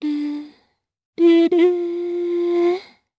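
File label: Vox Hum, Japanese; kingsraid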